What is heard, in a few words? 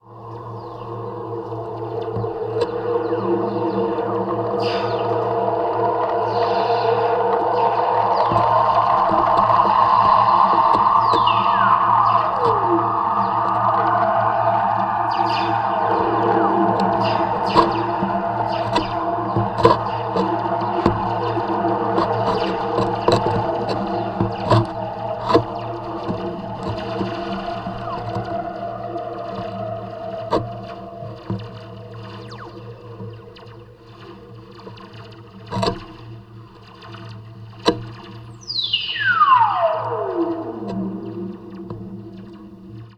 wind chirp mono, slinky